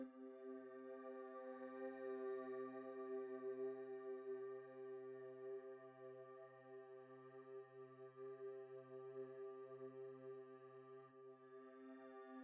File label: base, Music; gravity-fusion